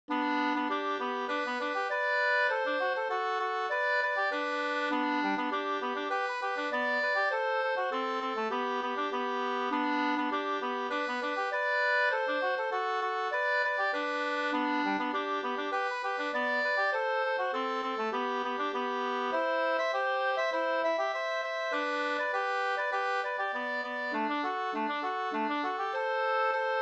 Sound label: polka